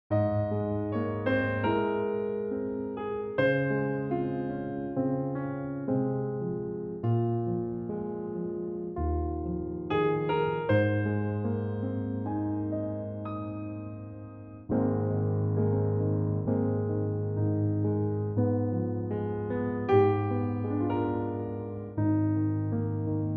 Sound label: Ab piano